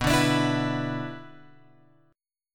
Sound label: B-Minor Double Flat 5th; Bmbb5 Chord {7 5 X 7 5 7} strummed